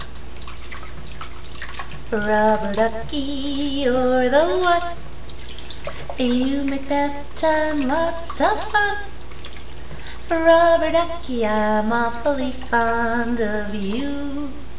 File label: sing